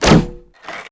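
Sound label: weapon; guarche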